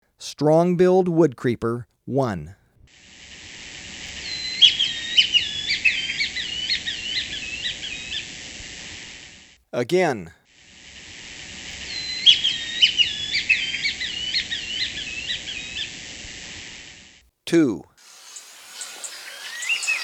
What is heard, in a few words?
Strong-Billed Woodcreeper; S. America